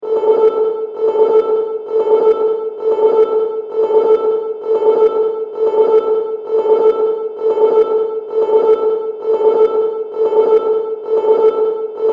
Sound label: Alarm Spaceship; 5.1